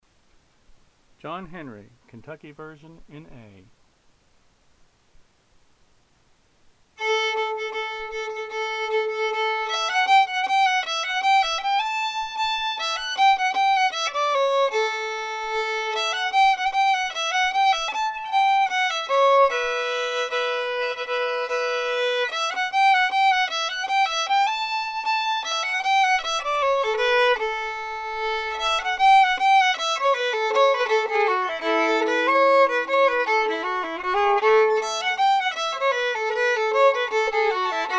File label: fiddle; John Henry